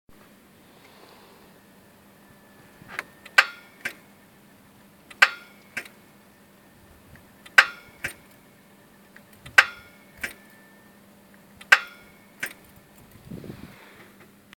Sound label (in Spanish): Motor arranque clic